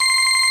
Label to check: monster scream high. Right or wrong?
Wrong